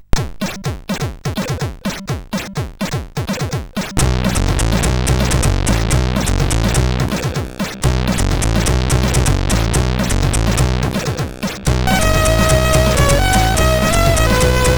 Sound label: tune, CSG8580R5; Party Demo 2 tune 2